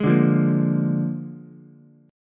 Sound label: Bb 7th Bb down Ukulele; strummed; JGuitar